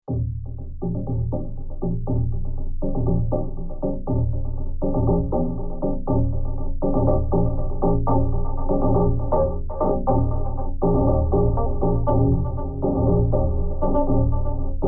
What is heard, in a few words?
Delay Groove